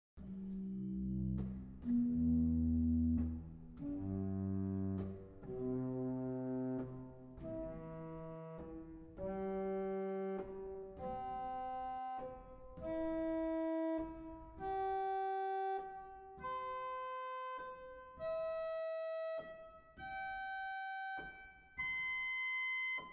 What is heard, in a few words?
Viole; CavColMainz